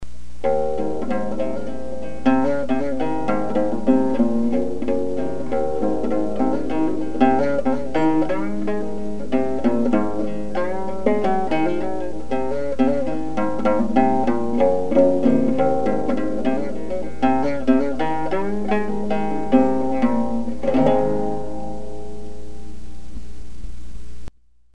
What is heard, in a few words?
gourd banjo